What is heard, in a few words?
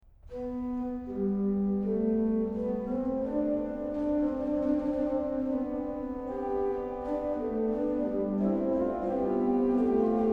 organ; Nun ruhen alle Walder; BACH; BACH ORGAN WORKS; CHORALE PRELUDES